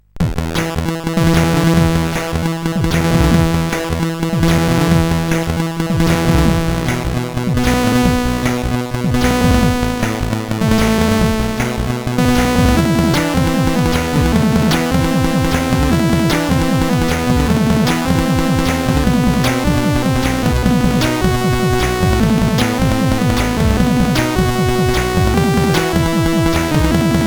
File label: intro sid CSG8580R5; Fighter Bomber